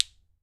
ILI ILI, HAWAII PERC; AKAI S6000 CD-ROM; WorldSounds

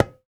Tablas; Spectrasonics; Supreme Beats; World Dance

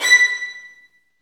STR VNB2, Vlns Marc; Roland L-CD702; Vlns Marc1-3

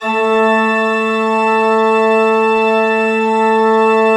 A2, Cathedral Organ, MANUAL PLENO; Propeller Island

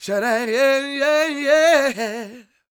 SOUL, Vocal Planet, Blues & Soul; CW SOUL

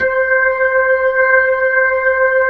B-3, Jazz

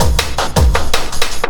Drum & Bass, 160 BPM; LOOP; Ueberschall; Drum & Bass Flash Trackz

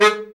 BRA Bari, SAX; Best Service Gigapack 1; BR SAX 2

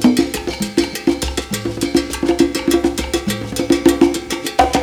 KOOL P LOOP, PERC RUN; Crypton - DrumBase5000

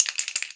Paint Can, household rhythm samples Hits

